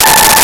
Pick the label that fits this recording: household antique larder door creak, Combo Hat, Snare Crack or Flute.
Flute